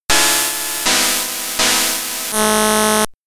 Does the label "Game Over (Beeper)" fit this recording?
Yes